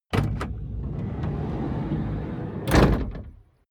car slide door open

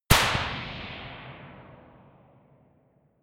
gun slapping pop gun; schusswaffen

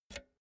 pull out jack multi socket; objekte; elektronik

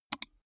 gaming mouse left click single fast soft

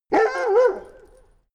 labrador bark sequence short; natur-tiere; hunde

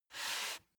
step barefoot wood plank slide normal; schritte; Footsteps

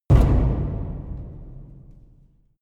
orchestra grancassa wood; whooshes-rises; hits-impacts

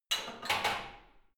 barbell heavy plates replace rack soft; sport freizeit; fitnessstudio

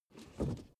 suv 1st gear release; verkehr transport; autos

